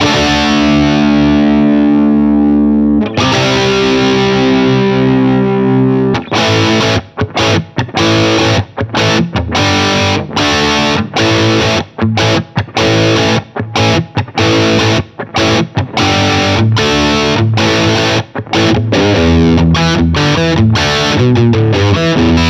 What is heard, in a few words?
Rock